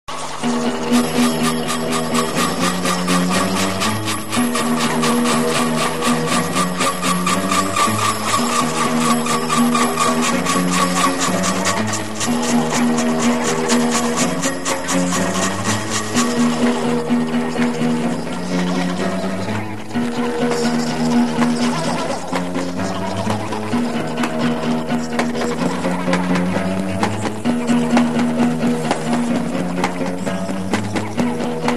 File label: instrumental; thrash